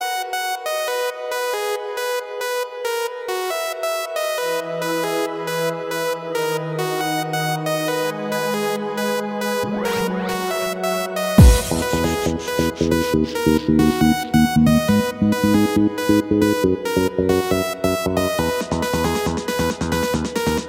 piano music tone ringtone

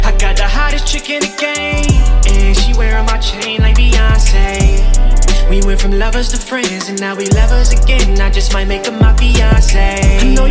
english ringtone

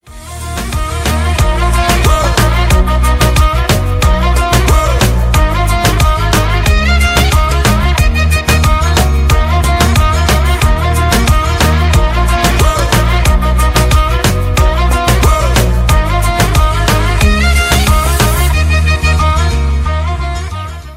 storm ringtone; iphone ringtones

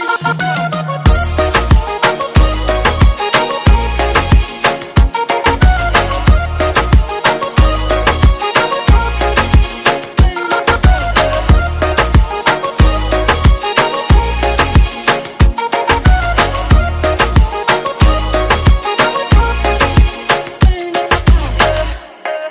english ringtone

